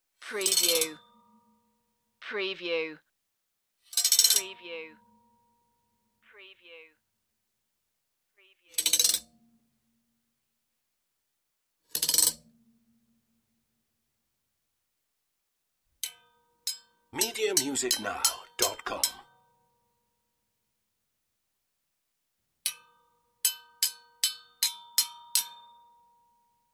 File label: metal bars slide